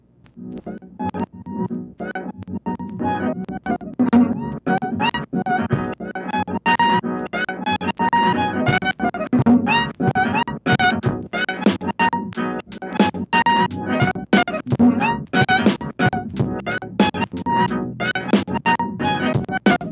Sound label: on the farm polyend tracker country lofi